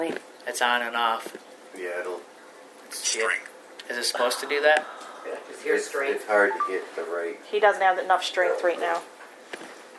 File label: EXHALE; Taylor House; taylor residential